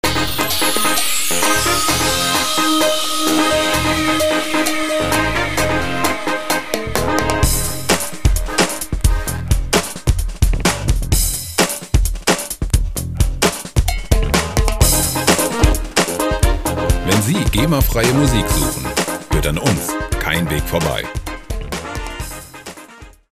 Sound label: brassmania